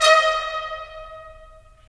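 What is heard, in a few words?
brass trumpets staccato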